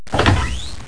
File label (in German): tuer auf; dorf